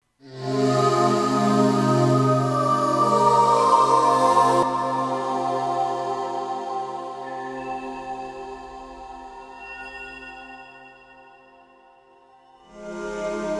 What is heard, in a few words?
Pad Pad Piano, PCM60